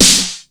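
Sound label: 909 snare gate; 300 Drum Machines; Yamaha Motif ES-Series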